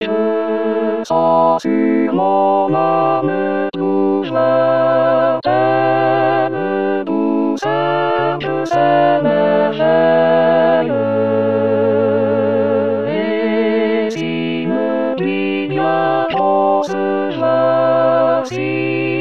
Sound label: alto; rimailleurs